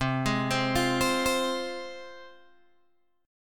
C Suspended 4th Sharp 5th, C, down, Guitar, Open G